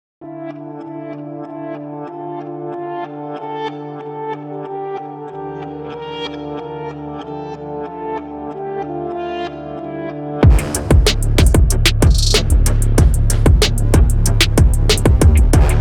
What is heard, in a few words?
Dance Sequence; Step Up High Water